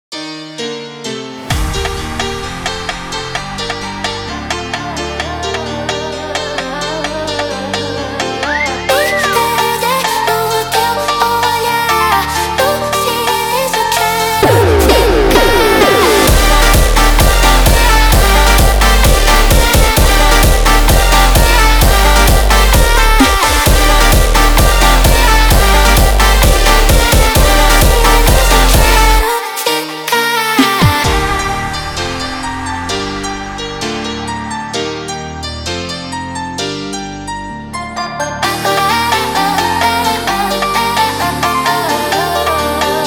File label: Hardstyle